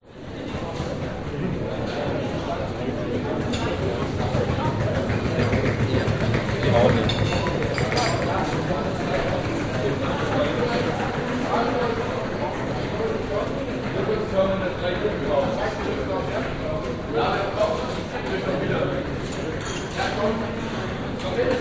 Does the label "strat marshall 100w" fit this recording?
No